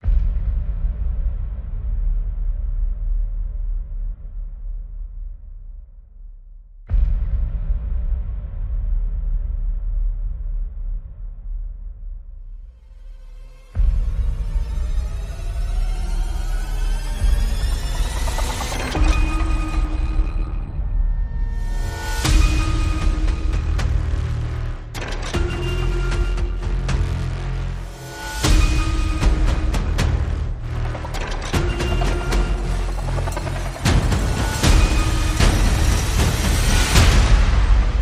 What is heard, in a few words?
Wheels On The Bus Horror, No Melody